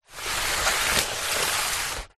foley paper lots pile scrunched up slide across wood; zapsplat